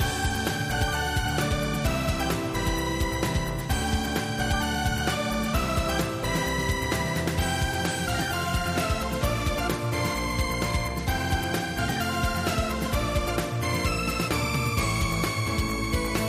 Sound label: Stageclear; Certain Magical and Scientific Mystery Inventory; Pazdex; puzzle RPG; Android